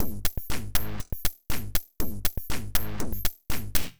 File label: Noise Beat; Drums & Drones